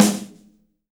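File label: snare drum; Best Service Gigapack 2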